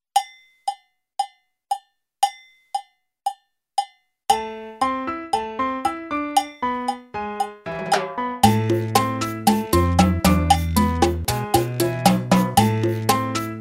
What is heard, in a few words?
Smoothie with click, Drum Kit; Drum Kit exam